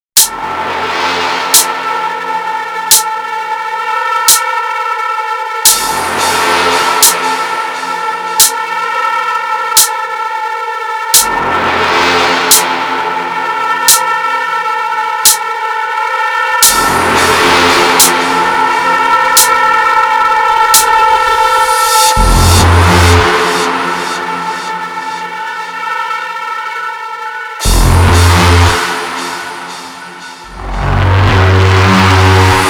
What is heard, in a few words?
funk train, dnb